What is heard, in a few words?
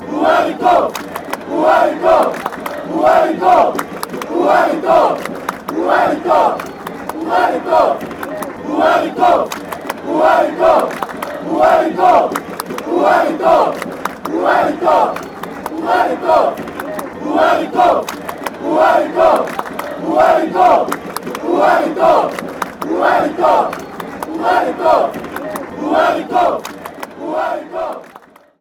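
sao paulo chant, football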